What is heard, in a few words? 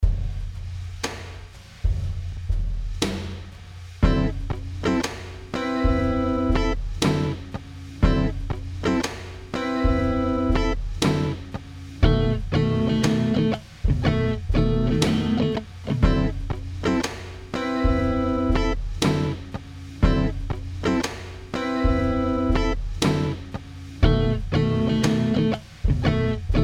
Jazz Guitar